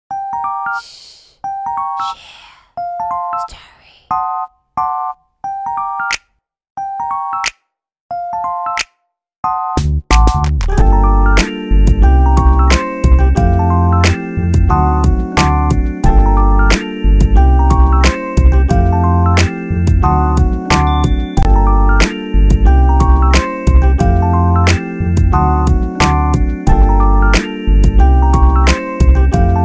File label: Instrumental